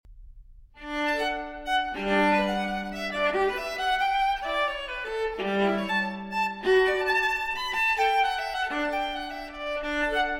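Cello Suite No. 6 in D Major Transcription For Viola Gavotte I-II; J.S. Bach; BWV 1012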